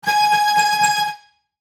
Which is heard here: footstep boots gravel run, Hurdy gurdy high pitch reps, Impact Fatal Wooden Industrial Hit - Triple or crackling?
Hurdy gurdy high pitch reps